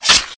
sword slice

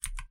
type, keyboard

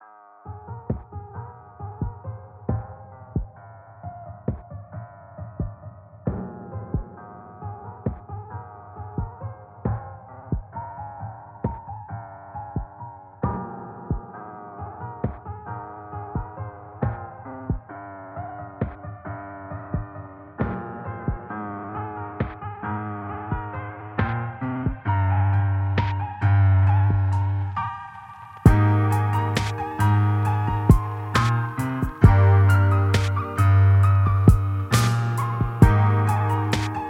relaxing plaza

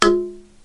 bonk

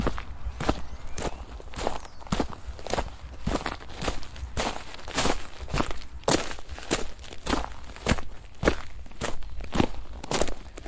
gravel, footsteps

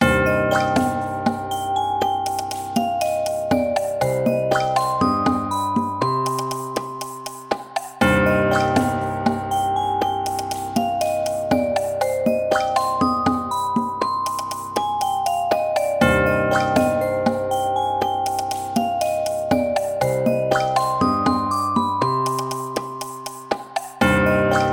cave theme

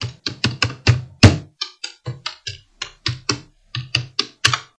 angry typing, keyboard

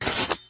sword unleash

